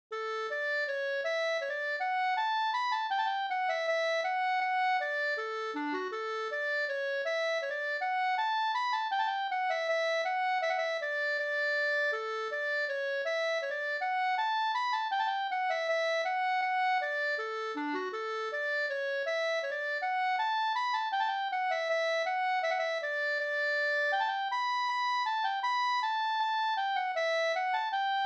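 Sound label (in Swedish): brudmarsch Klaxasen Jämtland; Jonkens brudmarsch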